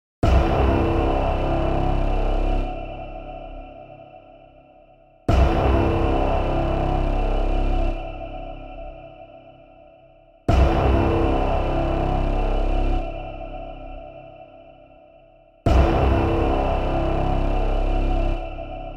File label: Hit Elements Brass C